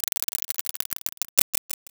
roulette

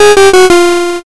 lose

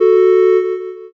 horn

